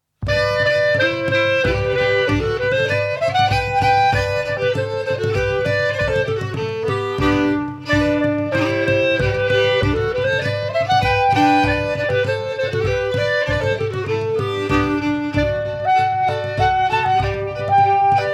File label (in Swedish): polska